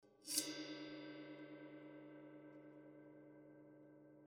scrape, Gong; Idiophones; Struck Idiophones; Gong 2

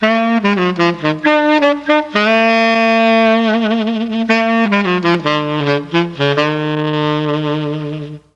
E4 foxtrot sax, mellotron

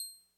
Clavisynth C6, TX81Z; Electrophones

